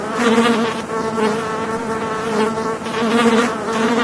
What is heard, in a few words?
flies; doom3